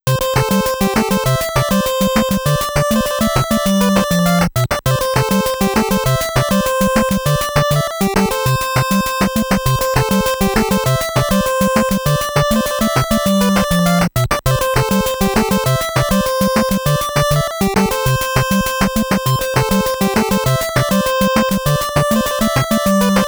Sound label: Stage BGM; Ninja JaJaMaru Collection Original Soundtrack